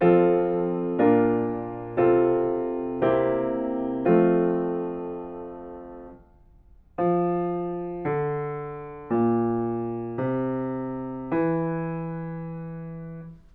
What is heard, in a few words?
melodic fragments; ear training